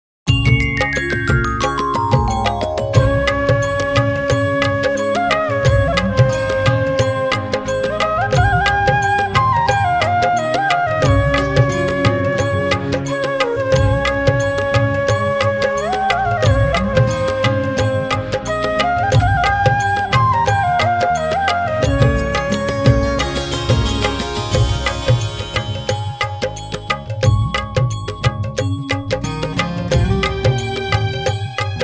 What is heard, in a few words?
Karaoke Music